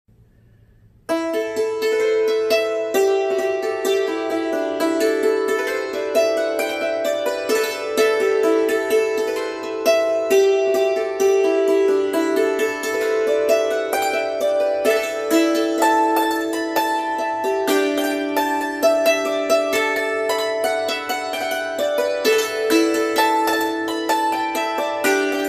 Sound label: polka on the hammered dulcimer; ballydesmond polka 2